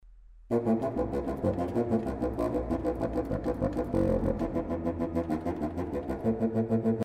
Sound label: Bass Nighingle